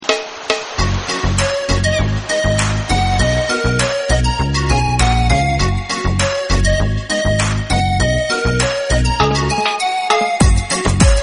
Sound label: radio instrumental mix